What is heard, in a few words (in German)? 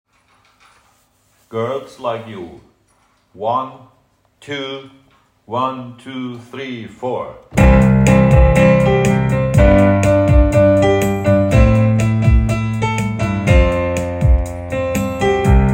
Klavierbegleitung